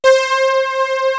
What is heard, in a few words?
POLY SYN, KORG, Analog; USB Soundscan vol.49; Analog Fantasies; AKAI